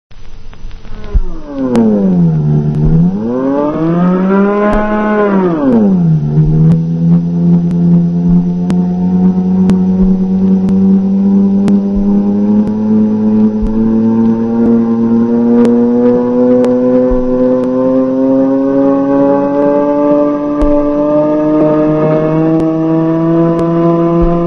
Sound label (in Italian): ululatore; russolo